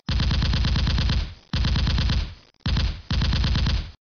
Machine Gun

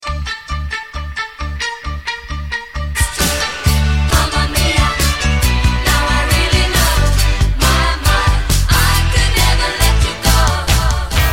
Disco Mix

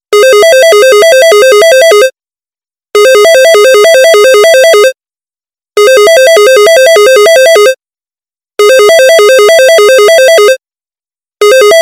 Office Phone

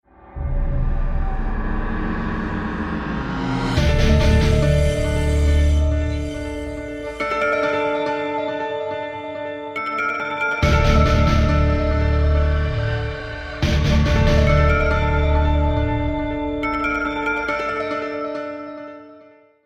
Horror

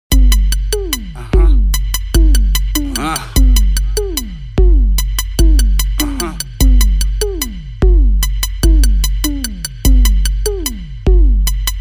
Hip Hop Bass

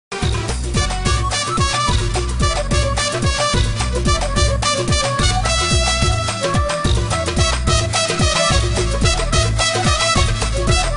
Trumpet

